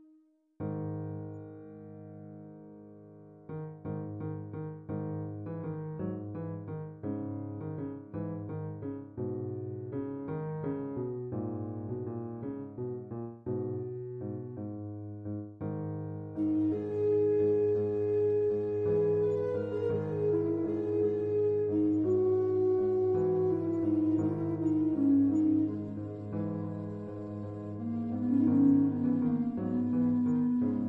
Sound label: Flute Quartet w Piano; The Old Castle; Pictures At An Exhibition